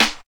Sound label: combi drums